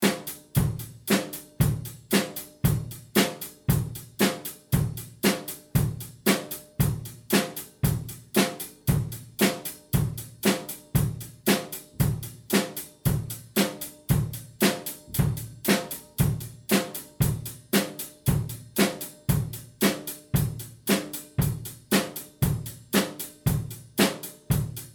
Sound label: drum along sample